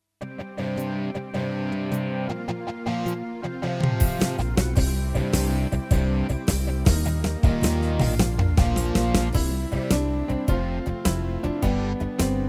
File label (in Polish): dzien dobry dzieci instr